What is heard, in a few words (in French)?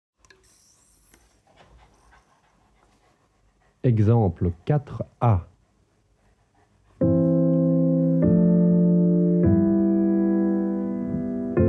harmonie